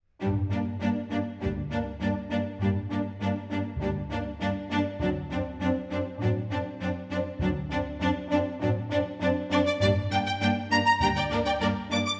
quirky venture

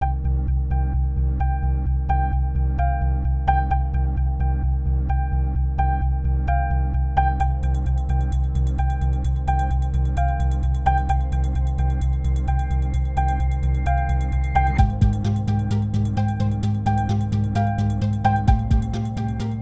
tension deceit

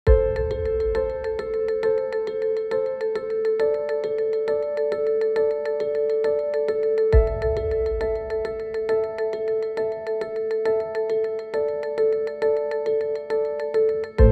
tension

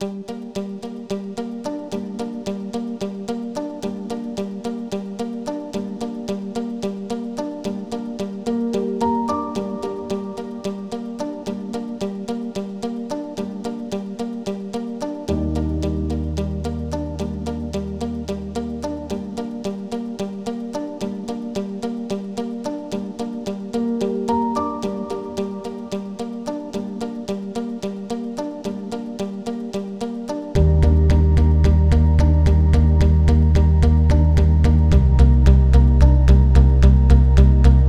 tension signals

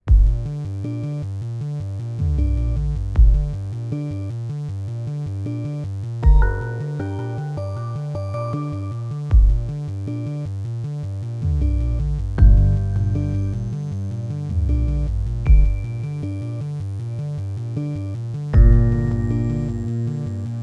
electronic misdeed

